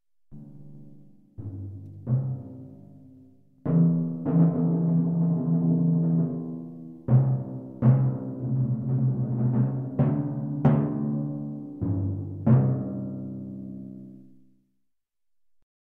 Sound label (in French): timbale